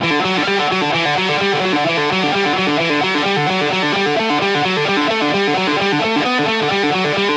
130 E Gtr Fast Tap Duel Btm; Guitar; Zero-G; Future Wave

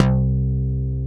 FM2+, Synth Bass; SYNTH-Basses; Sound & Vision; Gigapack I CD 2

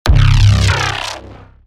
fm8, bass

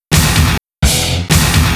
Guitar; Eclectic Electronica

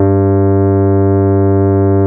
RHODES; Best Service Advanced Media Trax Modern Composer

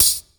CRASHER

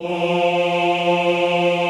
OHH F2, Classical Choir; Best Service; Extended Classical Choir

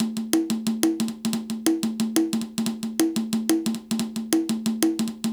Conga Loop; Sonic Foundry (Sony Creative Software) - Fluid Dynamics Drum & Bass; Construction Kit; Percussion

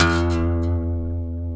F#, funk guitars

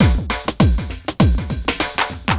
drloop, drumloops, Vinyl Attack; Masterbits

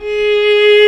Vla Ab3; Digital Sound Factory Virtuoso

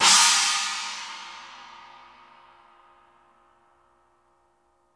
PANG SPLSH, cymbals; Sampleheads - New York City Drumworks VOL-1; SW CYMBALS

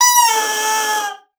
BASSOON; Xsample VOL-6

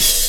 HH, Dance Drums

